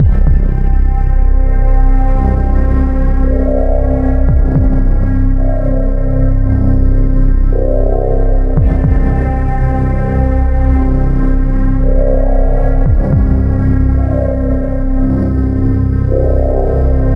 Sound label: music default ambience